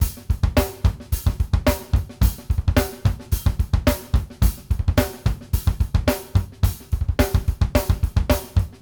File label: BASIC, Drumworks